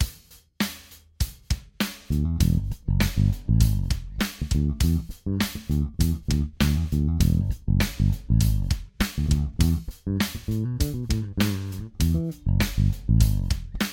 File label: 4 bass dgt